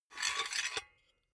silicone gun empty pusher push fast; soundpool

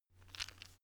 stone gravel clatter soft; natur-tiere; steine

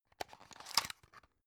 drug open soft; berufe; arzt

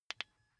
car key button click signal fast

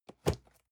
cardboard box medium place soft; objekte

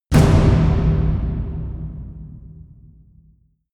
huge tom hits; music elements; percussion

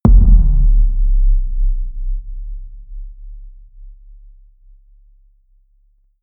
bass drop

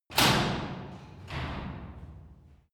garage door lock interior hard

